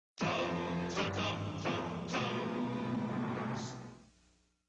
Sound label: jingle; tums